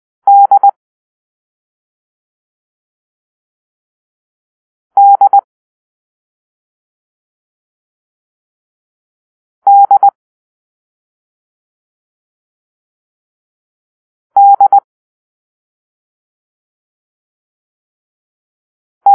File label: Delta (D), morse code